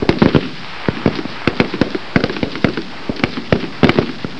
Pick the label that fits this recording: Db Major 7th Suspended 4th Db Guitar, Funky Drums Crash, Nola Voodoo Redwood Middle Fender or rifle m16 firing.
rifle m16 firing